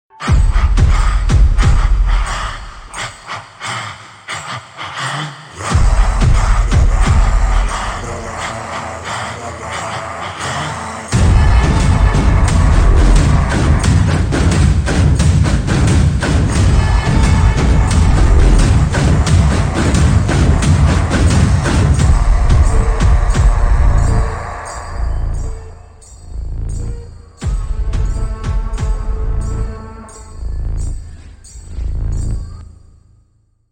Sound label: Ringtone Slowed Reverb; Allu Arjun